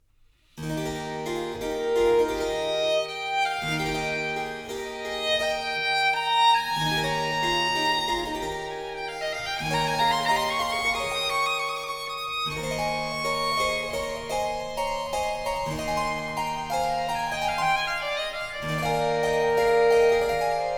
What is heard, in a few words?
Sonata No. 3 in E Major, Adagio, Violin and Harpsichord; BWV 1016; Bach; J.S. Bach